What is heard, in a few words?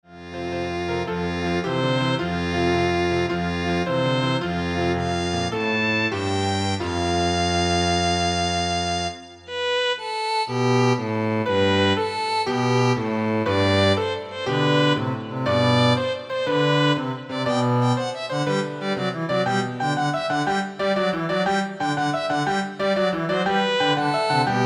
Piano Trio